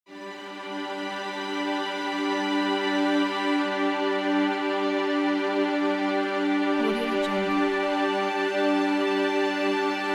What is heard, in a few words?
cinematic background soundscape